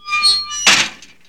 gate close

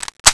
357 reload, weapons

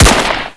drone shot

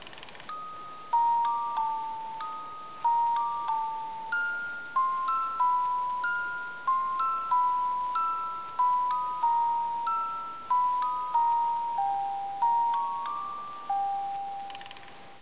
doll music

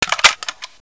m4 m203 close

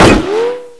helmet, damage

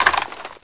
draw weapon

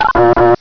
keycard denied

